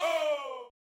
Not Nice Chants, Vox

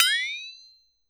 Flexi up, Percs

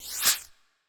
Smoke

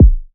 Kick

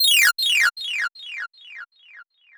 Perc

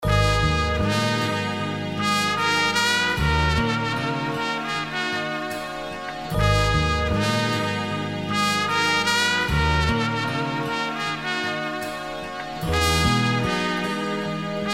BPM 152

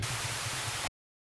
Town Noise

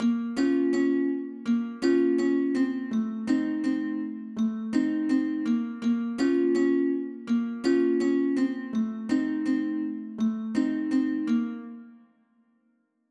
165BPM Omnisphere

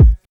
Kick

